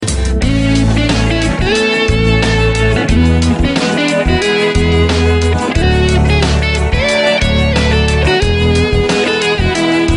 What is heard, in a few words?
Love Guitar